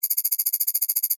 Technology Blip, Scifi, Technology, Blip, Beeps, Loop, Science, Fiction; Tech Sound Effects; BlastWave